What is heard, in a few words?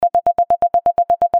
solo beep